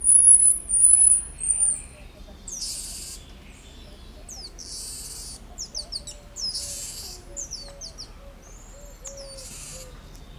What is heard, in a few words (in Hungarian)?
magas hangot adnak ki, lisztmajmocska; szegedi zoo 2011